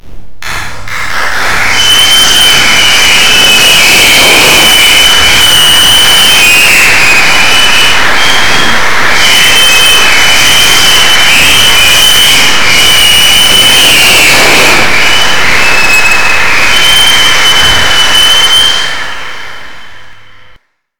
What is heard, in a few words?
Concert Applause